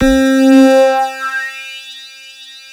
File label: SYNTHEX RESO; East West - Global Sounds; CEE SYNTH